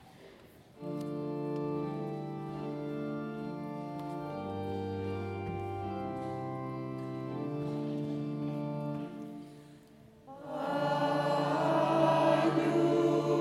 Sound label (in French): Agnus messe de Saint Jean, chorale; 4èmme Dimanche de l Avent